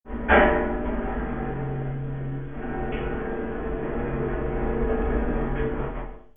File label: FRICTION